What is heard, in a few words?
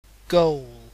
goal